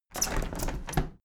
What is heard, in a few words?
window handle plastic squeak open tilt heavy; objekte; fenster